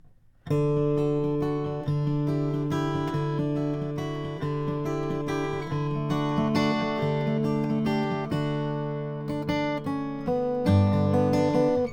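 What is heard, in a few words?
mcpherson mg 4 5 striped ebony, guitars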